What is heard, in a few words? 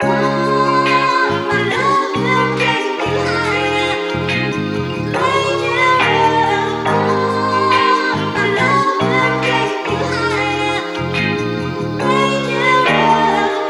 vinyl loop higher Gmaj